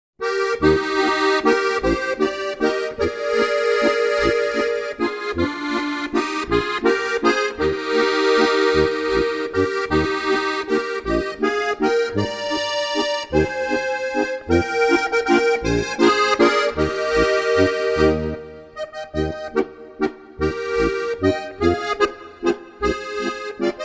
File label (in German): Walzer